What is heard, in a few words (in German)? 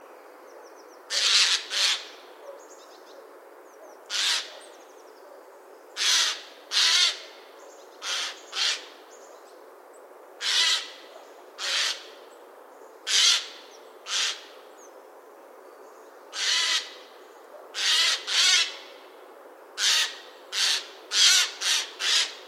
Eichelhäher Ruf